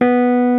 Rhodes Clean B3; Mo' Phatt; Digital Sound Factory Mo' Phatt

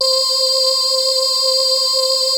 WAVETABL2 C5; DIG MYSTICS

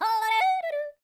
YODEL, World Voices; ILIO; Vocal Planet

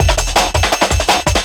Busy Snare Beat, Drum N Bass; Sonic Foundry (Sony Creative Software); Knutrix Drum & Bass

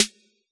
SD T8; Yamaha DJX; DJX Kit

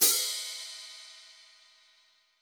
brush; Northstar - New Gold VOL-2; 50S BRUSH

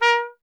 TP B; Miroslav Vitous - Solo Instruments VOL-1; Trumpet

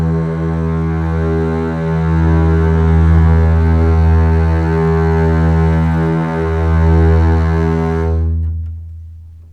E2 LEG F, String; Ultimate String Collection

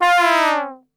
F 2 TRB, Brass Super Section; Best Service; 90 s Sample CDs

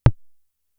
Tom, Toms; Fricke Schlagzwerg; Drum Machines

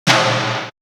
Tom, Drum One Shots

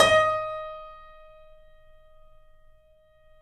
D#, The Piano; InVision Interactive - The Piano

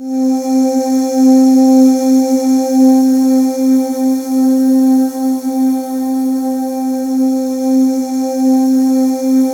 C4, Synth Leads

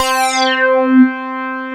SYNCO REZ, PROPHET, OLD SYNTHS; Rubber Chicken Software Sample Bank; SCI PROPHET

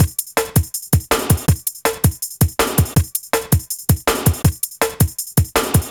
162, breaks; M8

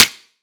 claps, dance drum kit

